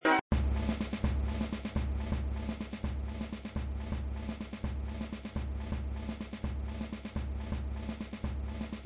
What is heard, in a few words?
Music Soft drum rolls (looping), flipper; Indy